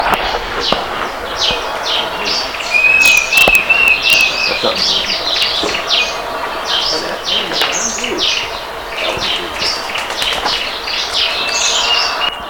kenya rufous sparrow

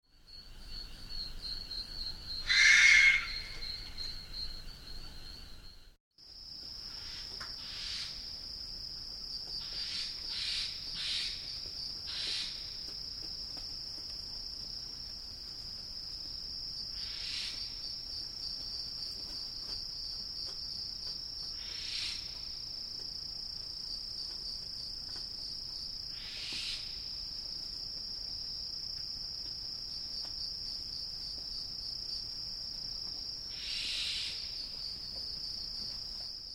australian barn owl

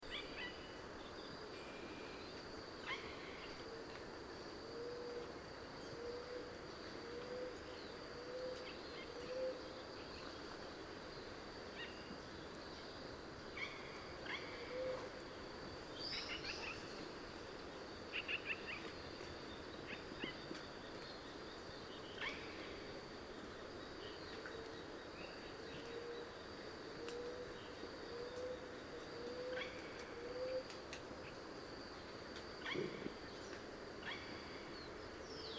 new caledonian parakeet

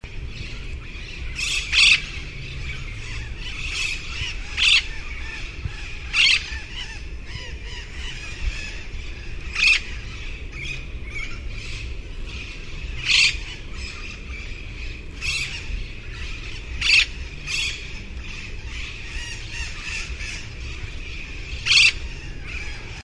slender billed parakeet

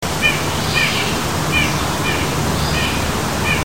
white breasted nuthatch

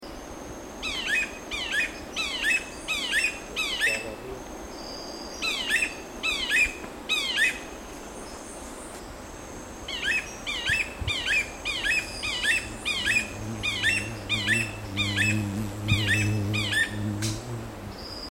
stripe cheeked woodpecker

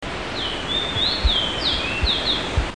field sparrow

